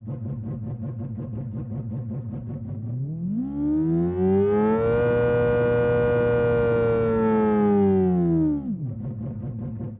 rotary 4 rotor, engine sound

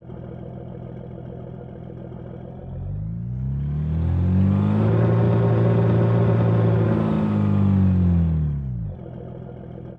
bus classic, engine sound

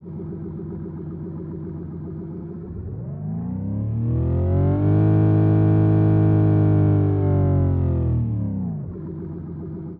i6 german free, engine sound

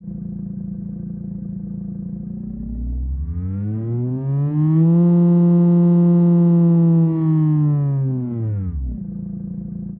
rotary x8 ext, engine sound

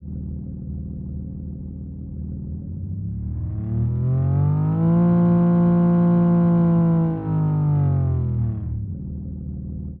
i6 german sport ext, engine sound

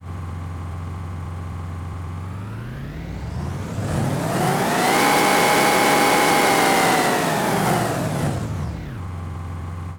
city, engine sound

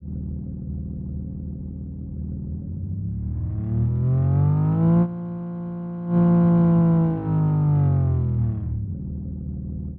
i6 german sport, engine sound

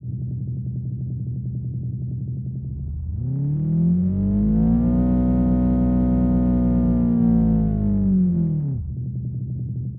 boxer japanese engine, engine sound

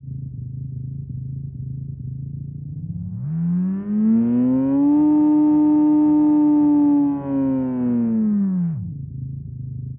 rotary x3 ext engine, engine sound

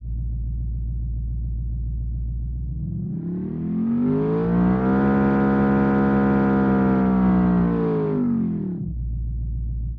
v8 american modern, engine sound

